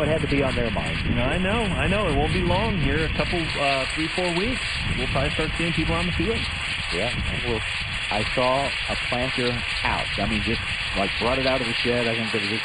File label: loading grain bin radio severe